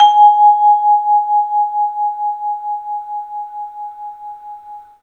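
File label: tubular bell; 3D Sound; 3D Keys VOL-1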